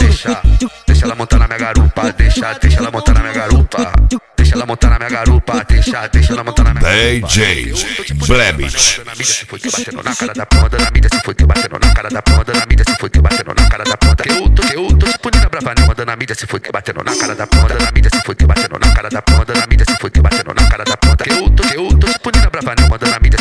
funk sertanejo

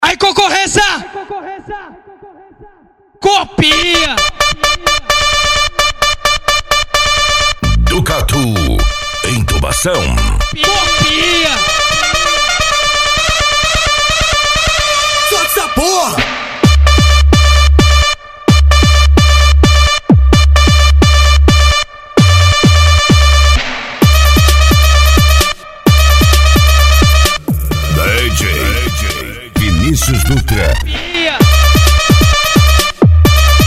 funk agressivo

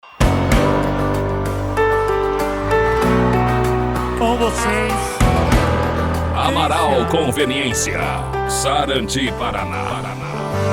funk e sertanejo; amaral conveniencia; sarandi pr

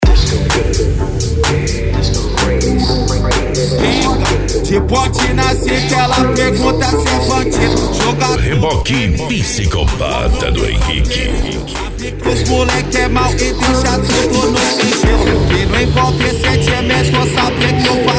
eletro funk

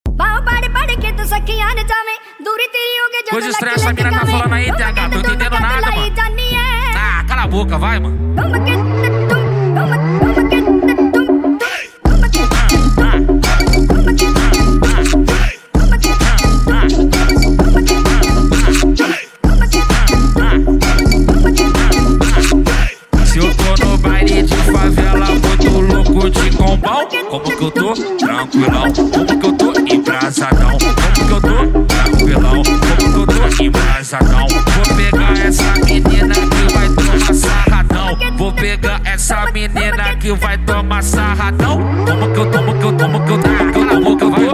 mega funk automotivo

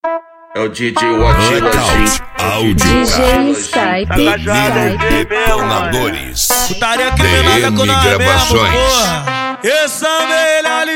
funk house remix

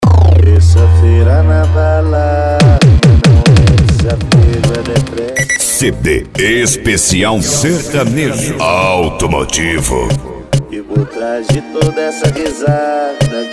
sertanejo automotivo

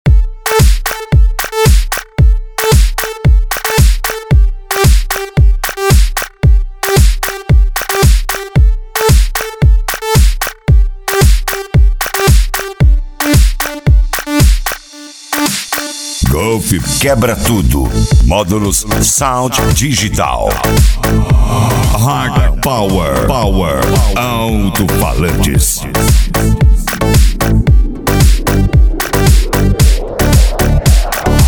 eletrofunk

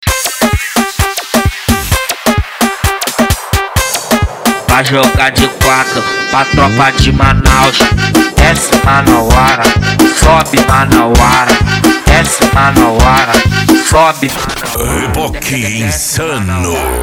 noia dance e funk mega bass

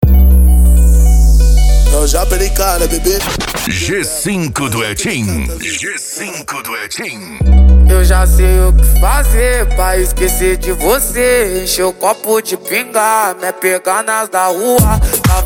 mega funk e funk mega bass

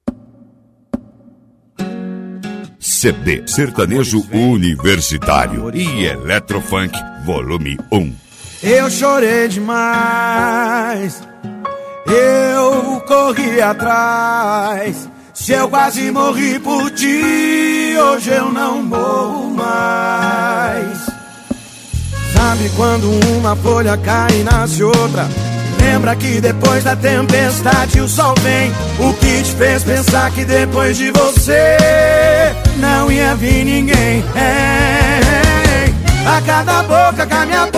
eletro funk e sertanejo

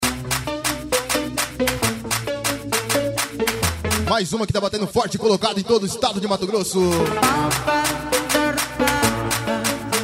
funk ritmado; especial cuiaba

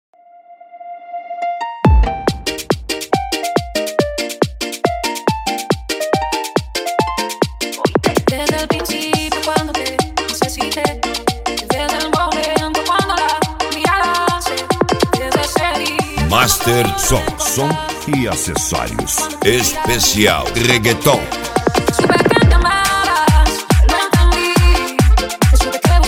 reggaeton

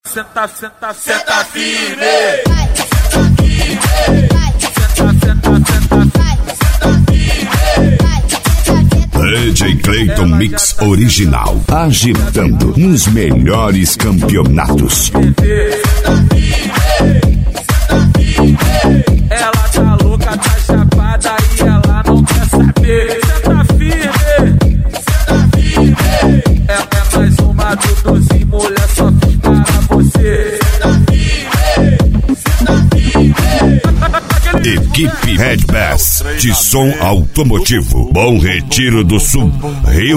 som automotivo; equipe redbass

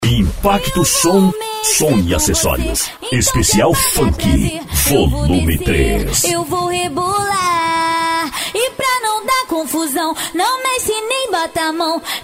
funk bass; impacto som

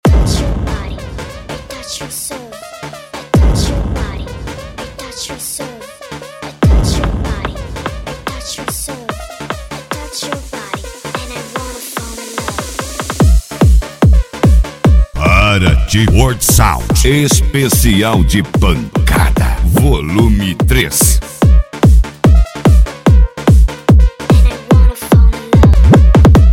esp pancada

